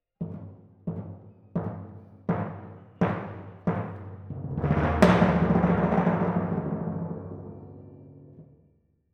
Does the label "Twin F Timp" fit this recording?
Yes